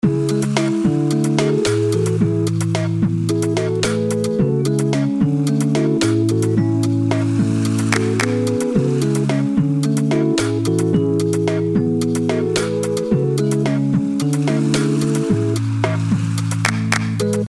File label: Cute Little Loop